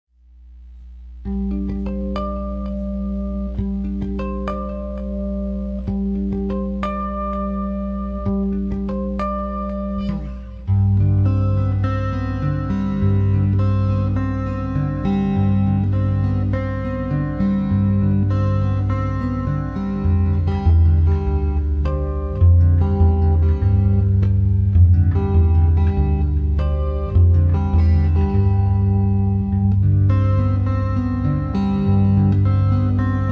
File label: Guitar Instrumentals